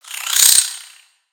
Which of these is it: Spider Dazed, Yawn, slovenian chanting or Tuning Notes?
Spider Dazed